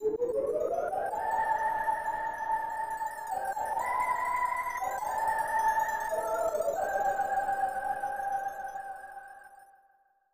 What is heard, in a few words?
Flute